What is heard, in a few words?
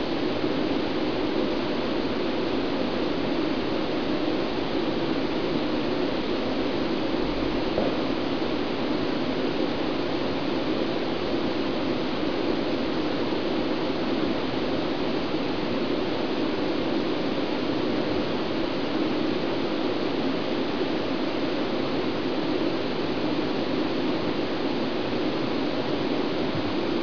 AC 968ft 4in dia, Water Leak Detection